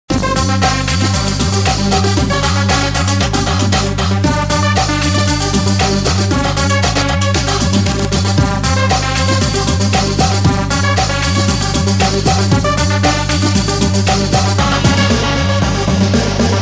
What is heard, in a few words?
electro punk ringtone